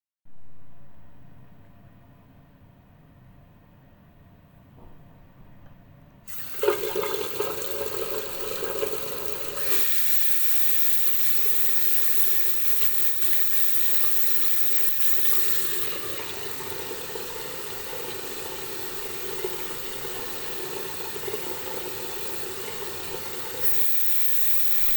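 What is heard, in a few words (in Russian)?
звуки которые я сделал используя мою раковину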